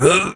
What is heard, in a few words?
Jump Adult Operator; Warframe; New War